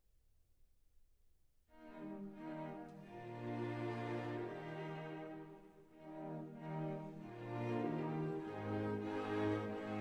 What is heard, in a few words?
Overture in E-Flat Major